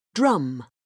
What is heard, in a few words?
drum